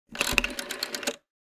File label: rotary phone